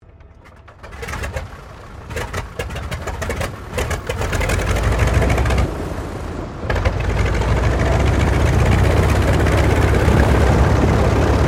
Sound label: AIRCRAFT T-28 TROJAN STARTUP IDLE; Trojan fighter bomber; Airplanes; Vehicles